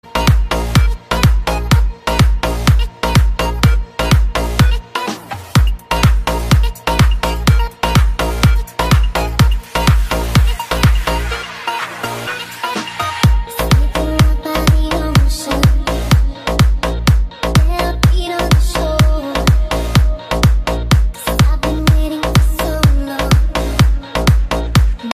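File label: arabic progressive ringtone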